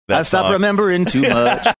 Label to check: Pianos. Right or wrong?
Wrong